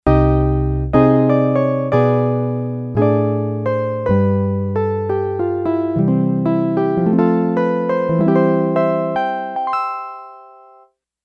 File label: Yamaha DX11 Upright; Factory Preset Voices